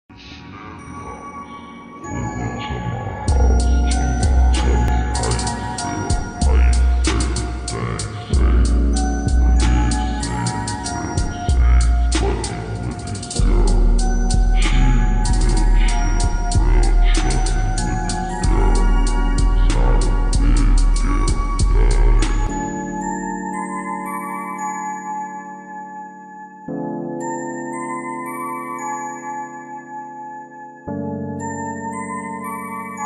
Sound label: slowed reverb